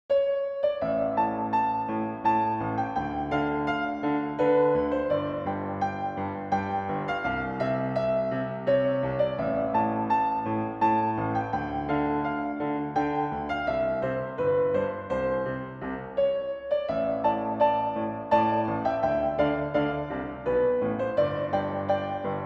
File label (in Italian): minuetto